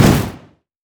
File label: turret explosive; LoL; heimerdinger